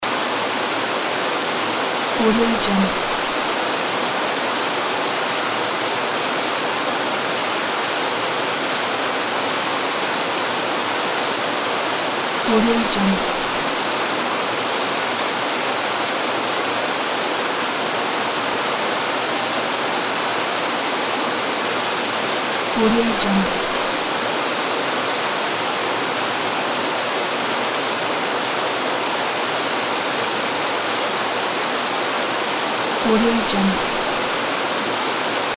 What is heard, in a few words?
small weir under a bridge